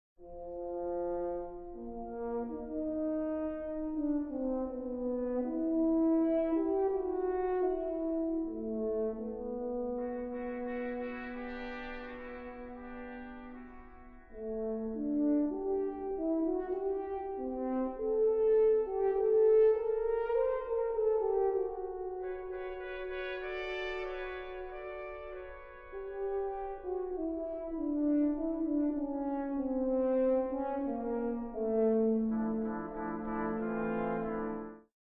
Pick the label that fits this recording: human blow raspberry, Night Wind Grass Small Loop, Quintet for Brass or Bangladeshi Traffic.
Quintet for Brass